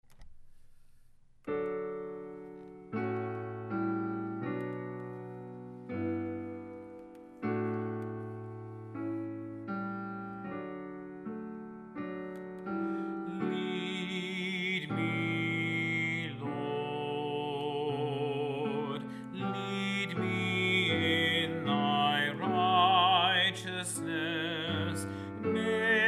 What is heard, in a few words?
Bass; Lead Me, Lord, S. Wesley